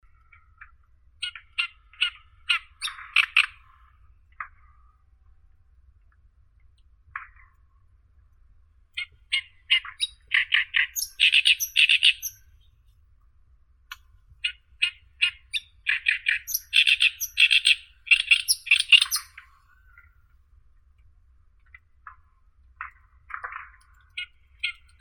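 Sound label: oriental reed warbler, acrocephalus orientalis; kamishovka drozdovidnaya vostochnaya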